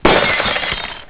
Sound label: BLOCK; pang